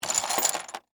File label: Game Piece Out, Board, Remove, From, Box; Sports